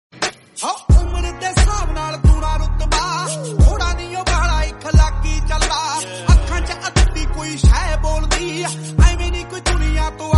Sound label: attitude punjabi